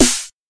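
00's Subtle Reverb Steel Snare Drum Sample C# Key, Royality free acoustic snare tuned to the C# note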